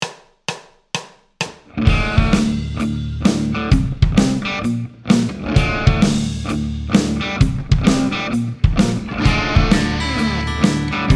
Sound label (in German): Dissonanzen nutzen ohne Bass; kursteil 4